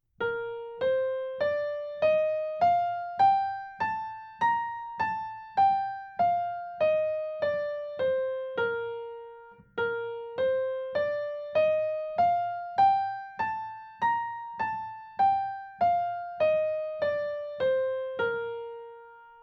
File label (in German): B-Dur Tonleiter Übung